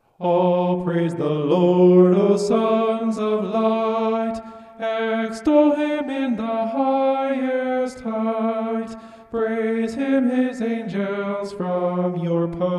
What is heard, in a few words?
vocal homoph; psalm 148